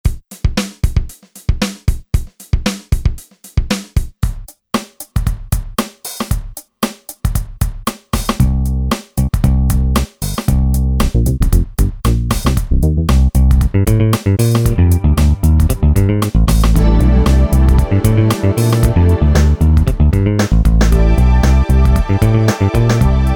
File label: FUNK split, Generalmusic Equinox, Grooves